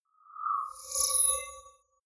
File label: appear twitter